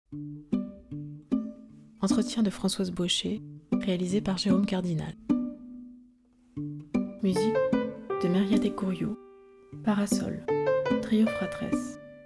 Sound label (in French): Musiques et voix; Rencontres de Kervic 2018